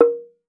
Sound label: TONGUE DR; TONGUE DRUM